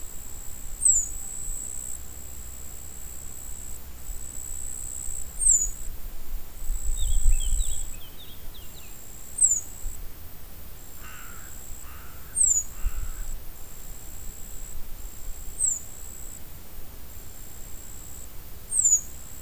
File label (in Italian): canto verso zigolo nero emberiza cirlus cirl bunting escribano soteno; bioacustica